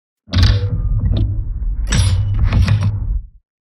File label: Metal sliding door